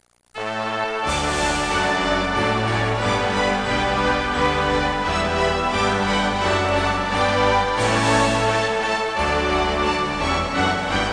ANTHEM; Macworld (1998-02); Games World; Football'97 Demo

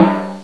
timpani drum, percussion instruments; Sound Effects for Multimedia - Volume 1

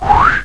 uich; HyperSlider 1.8 Demo Show

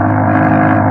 kerb

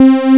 saw lead; gmplay

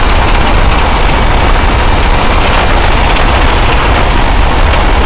turb

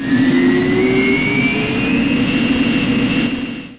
APU (from jet AB); A-10 Attack! DEMO

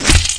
SPLAT; Word Wizard Games

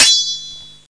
sword shield; Revista CD Expert 42; Grounch

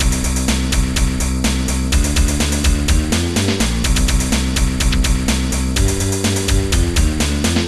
Bonus; FRACAS 1.0; Fracas Music

